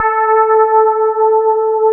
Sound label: SPARKLES; Wizoo - Powered DX